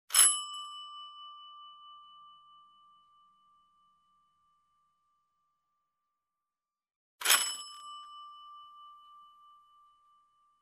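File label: old doorbell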